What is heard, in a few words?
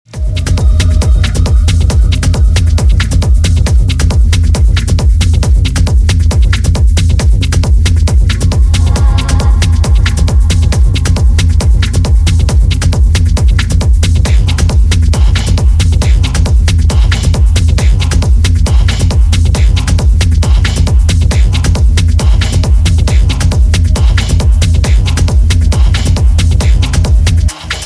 Tireno Swing